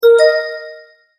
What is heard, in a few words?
multimedia tone verified